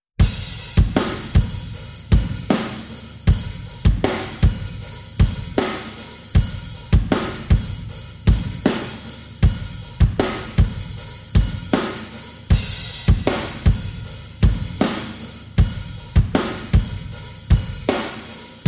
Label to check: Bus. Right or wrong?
Wrong